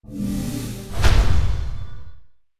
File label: Fear